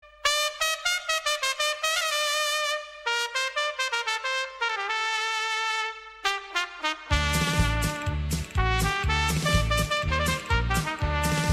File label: Pepe Trompeta